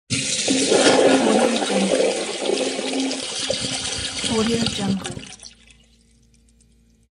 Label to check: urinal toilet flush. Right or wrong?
Right